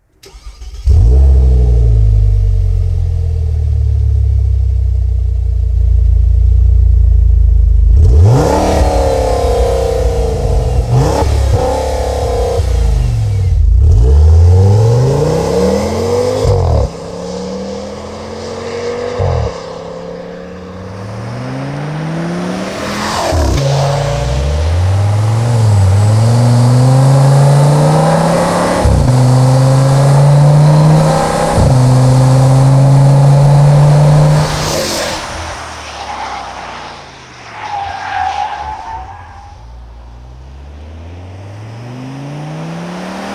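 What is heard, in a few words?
Gelandewagen engine sound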